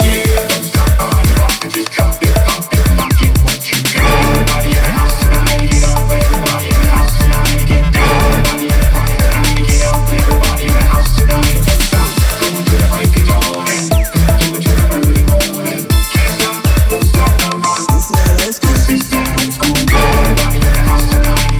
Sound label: music dance sway